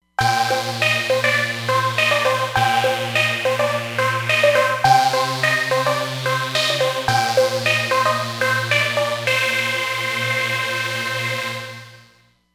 Roland Fantom XR; Tempest

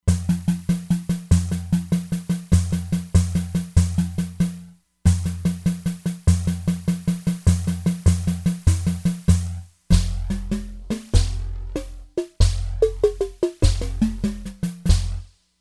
Sandrum, E-mu Proteus-3; Factory patches

